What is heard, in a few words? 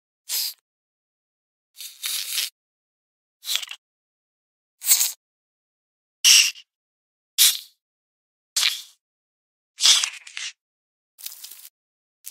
Silverfish, ماینکرافت